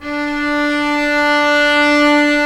viola D3; AKAI S-Series CD-ROM Sound Library VOL-2

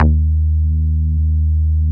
CRACK D#2, BASSES

